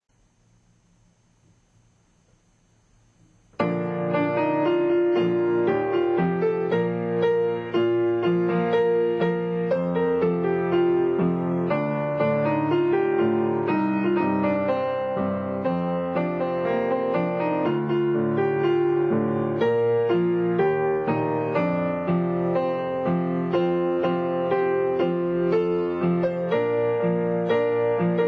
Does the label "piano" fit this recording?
Yes